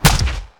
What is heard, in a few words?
baseball hit; lilmana; smite; vamana